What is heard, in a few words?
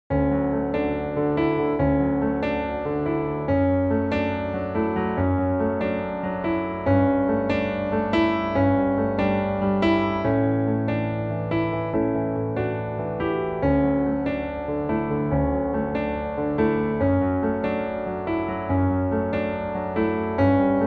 Eb piano; key - D to Eb; vocal range - D to C; piano only